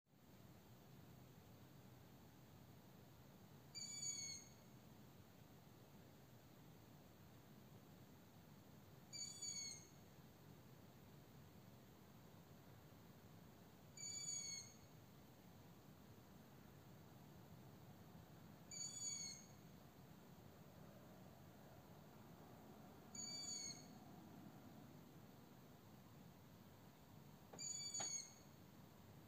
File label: My owls